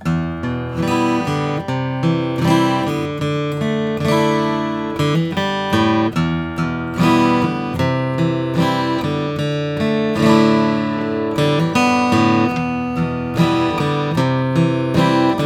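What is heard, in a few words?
taylor w 14ce spring limited natural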